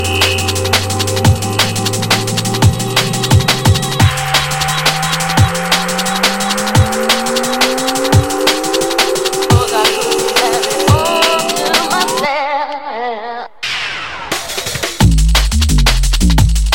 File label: bass all